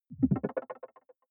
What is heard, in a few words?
button echo marble bubble; soundpool